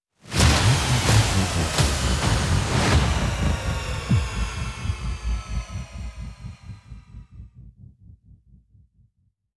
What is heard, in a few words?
Music Cool Down